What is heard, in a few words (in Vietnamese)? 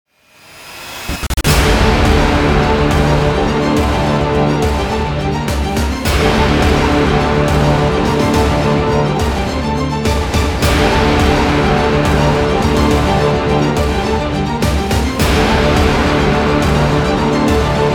Nhạc trailer kịch tính